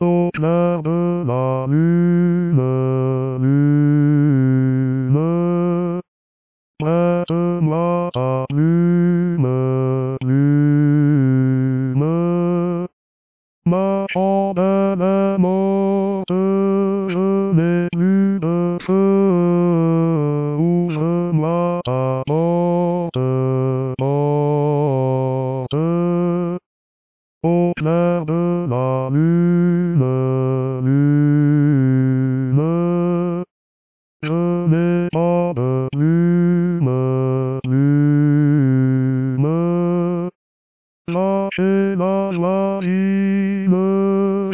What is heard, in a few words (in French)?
Basse; Au Clair de la Lune